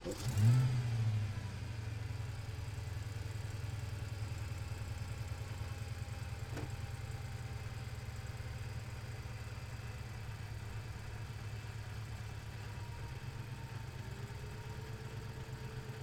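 Dodge Challenger Hellcat Onboard Medium Drive Various Off Engine Left; DPA4062; 2015; Pole Position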